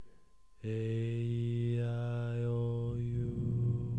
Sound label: aeiou Low